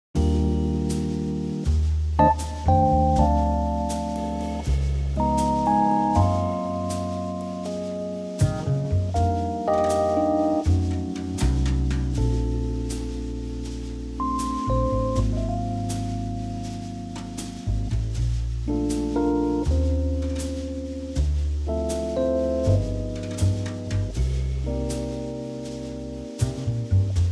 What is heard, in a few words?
Bb Rhodes; Fender Rhodes Trio; key - Bb - vocal range - F to G; Fender Rhodes replacing the acoustic piano